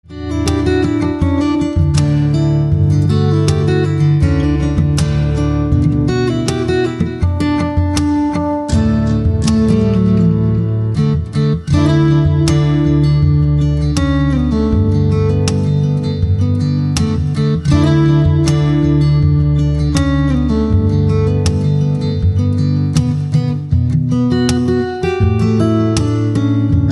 Ringtone only guitar

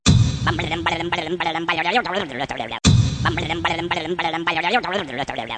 Babble Notification Ringtone

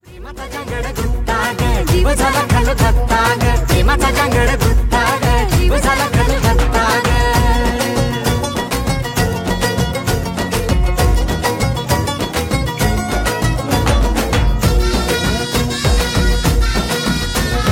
Marathi Song

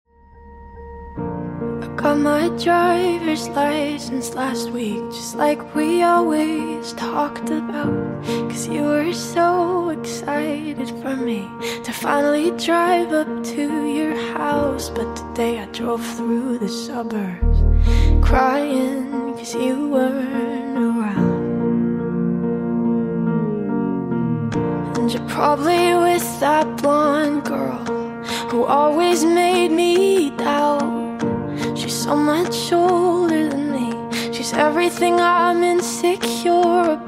Liquid Z520 - Acer Ringtones

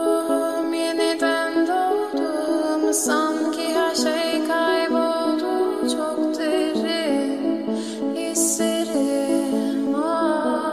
Ringtone

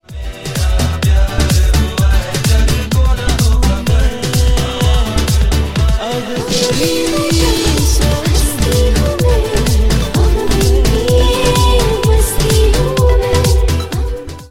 Action Reply Remix Ringtone

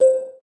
huawei tune

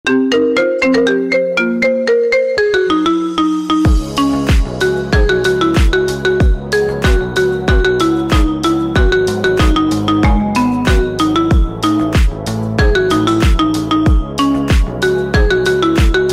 iPhone XR Ringtone

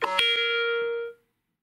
iPhone 12 Input Ringtone ios 14

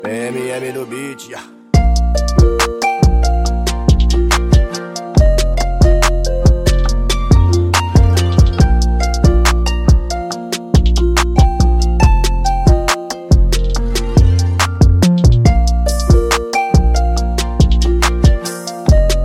Samsung Tune Trap Remix

